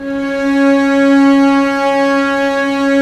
STR, Vcs Sordino; STR Vcs Bow FX

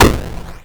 Crushed Perc, bitcrushed; musicradar

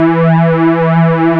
JUNO, Juno Synths; E-MU Formula 4000 Series Vol. 3 – Analog Odyssey

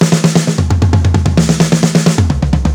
87 HUGE TRIPLET, drums; Basement drums vol 2

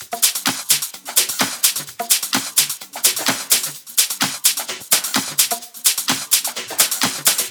Loops 128BPM, Drumloops

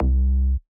Pukka Bass; Big Fish Audio; Old Skool House; OSH Bass

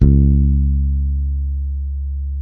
SADWSKY MUTE, Bass

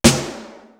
METAL SNARE; East West; Global Sounds

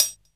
HH, Dance Mega Drums; Best Service

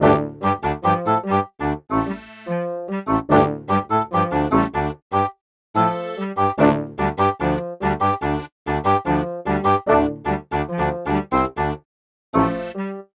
horns; Sonic Foundry (Sony Creative Software); Cinematic Funk Urban Tone Poems; Session 05 - The Triad

